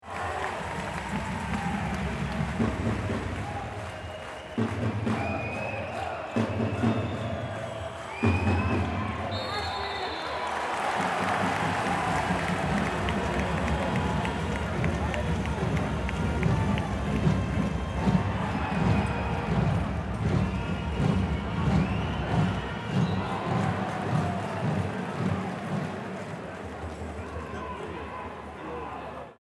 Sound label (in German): Handball Hallen Spiel Atmosphaere